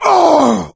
hurt critical, survivor voice; Left 4 Dead 2; coach

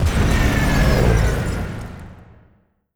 distance airplane burn; Hearts of Iron IV Death or Dishonor

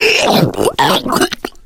female boomer alert; voice; Left 4 Dead 2

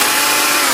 dog servo; Garry's Mod; hl2

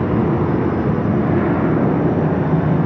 distance airplane jet idle; Hearts of Iron IV Death or Dishonor

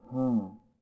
Gasmask Fidgt Vox; Sid Meier's Civilization 4 Complete; Beyond the Sword; Units

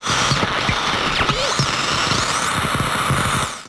Static; Sid Meier's Civilization 4 Complete; Beyond the Sword; Final Frontier; Units

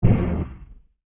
Grave Hurt; Sid Meier's Civilization 4 Complete; Beyond the Sword; Units

